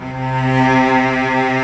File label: CURT CELL; AKAI S-Series CD-ROM Sound Library VOL-7; CELLO+VIOLIN